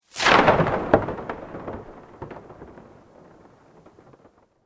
Sweep Organic Medium

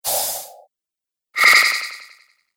Magic Spells; Magic Sound Effects

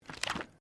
Book Page Gloss Turn; Book movement; Books (Sound Effects:Offices)

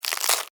Insect, Eat, Chew, Bite, Bug; Crunch; Insect Eating Sound Effects; Insects Eating (Sound Effects:Animals:Insects); Blastwave

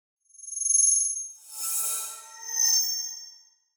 Crystal Whoosh Rear To Front; Whoosh Multiple Reverse Delay Production Element Imaging Element Accent Transition

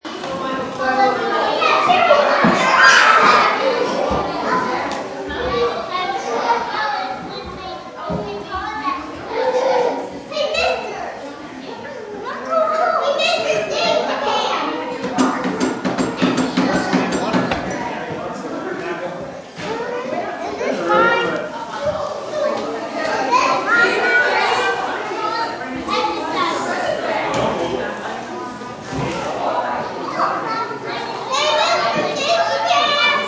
Kids Play Indoor Gym, Kids playing; Kids Play at Indoor Gym; Playgrounds (Sound Effects:Backgrounds)

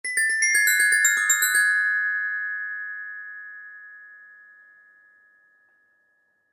Glockenspiel Melody Twinkle; Mallet Sound Effects; Musical; Cartoon

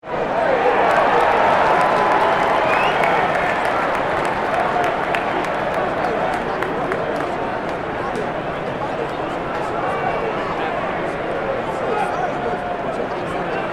Baseball Game; Sports

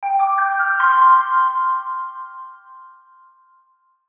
Logo Synth Melody Reverb; Sonic Logo; Blastwave

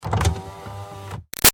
Car Highlander Power Window Up Short; 2005 Toyota Highlander V6; Cars; Vehicles; Big Room Sound

